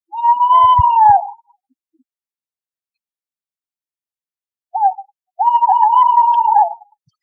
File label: Tawny Owls song higher pitch; Marcot